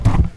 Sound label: Drop; DCS